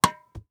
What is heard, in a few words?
Sports Tennis Racquet Hit Racquetball